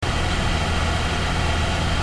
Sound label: fan